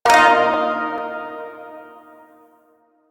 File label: Shawzin Blues Chord